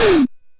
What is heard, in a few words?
Drums, Hits; DCM8